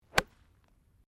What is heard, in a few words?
GOLF SWING IRON HOOK; Golf Sound Effects; Various Golf sounds; Golf (Sound Effects:Sports)